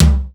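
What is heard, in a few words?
TOM, drums; East West; Global Sounds; SPD11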